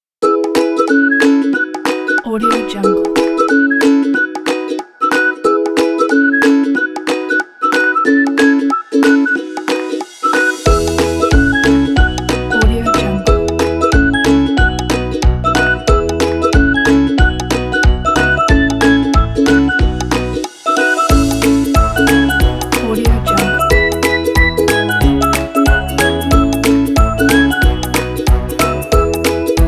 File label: the fun kids